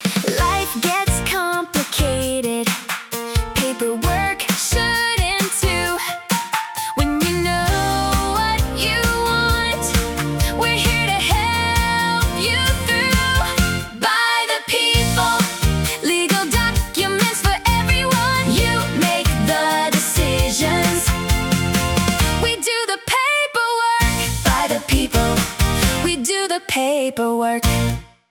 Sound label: jingle; by the people jingle